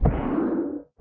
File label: guardian hit; minecraft; mob